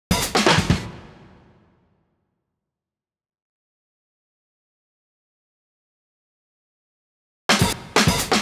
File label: drums acoustic